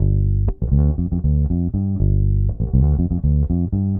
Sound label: Bass, 70s Analog Funk